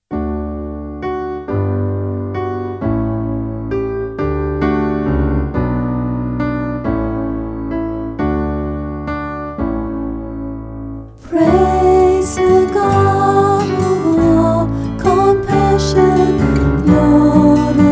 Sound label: Lenten Gospel Acclamation